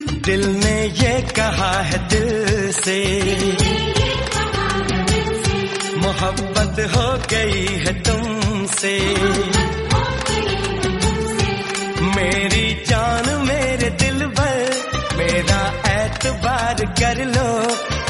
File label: hindi song ringtone